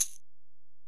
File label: Ac Perc, Drum Machines Alesis DM-PRO